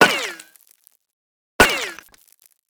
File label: Resynth Stone Hit Gun